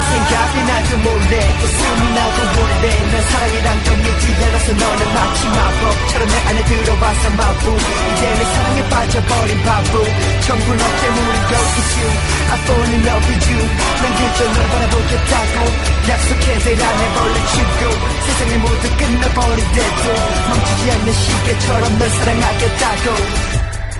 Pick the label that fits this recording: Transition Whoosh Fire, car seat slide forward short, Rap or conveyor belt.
Rap